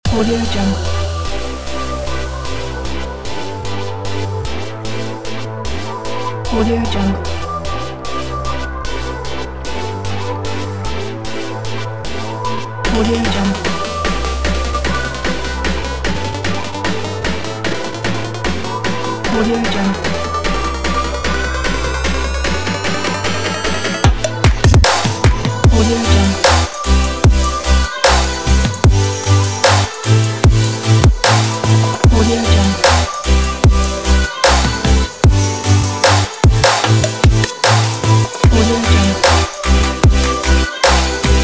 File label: future bass